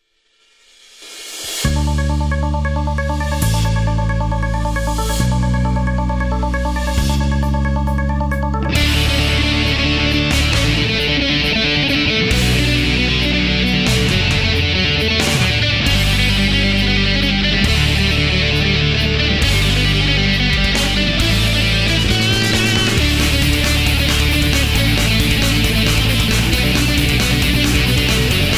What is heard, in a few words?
Canon Metal